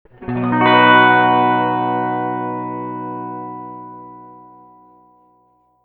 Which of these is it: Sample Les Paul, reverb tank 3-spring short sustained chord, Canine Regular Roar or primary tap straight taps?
reverb tank 3-spring short sustained chord